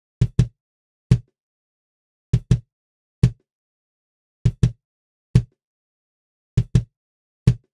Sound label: snare cymbal bleed removed; e expander600